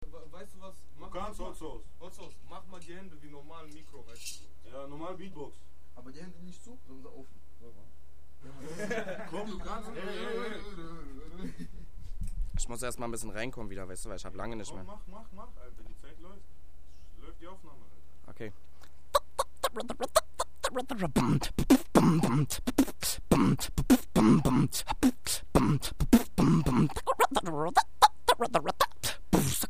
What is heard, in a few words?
beatbox